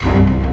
Music Fight Vikings Theme Hard Start; asterix obelix slap them all 2

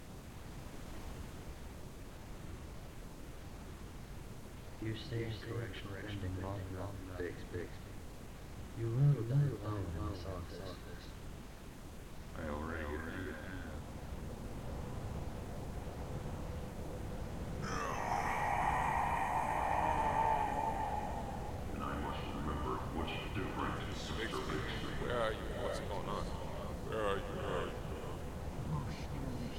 Ministry Limbo Ambience Full; gamerip; door to door

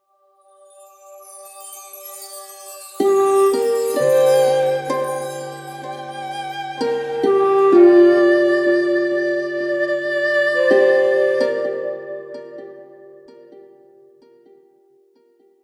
Night Set Up stinger

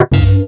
Boss Hit

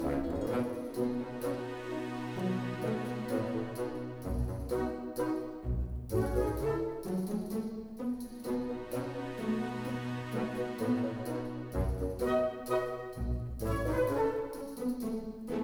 Ambiance 64bpm Loop; asterix obelix slap them all 2

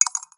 Ammo Shell; Cruelty Squad

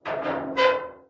Creak Metal Other; Puddle; puddle plus ios gamerip 2018

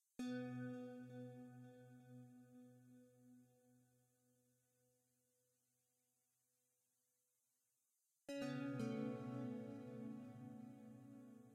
City Synth Ambience Background; gamerip; door to door

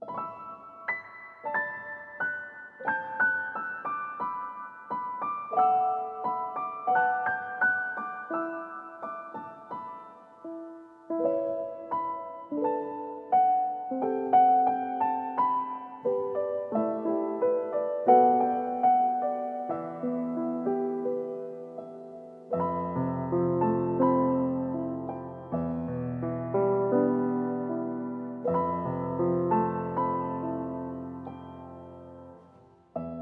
piano solo; noahmund; berani

